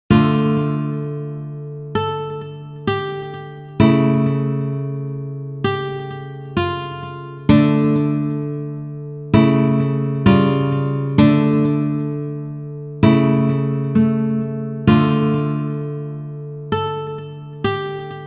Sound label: tragedy, cutscene; peacefree; adventurecat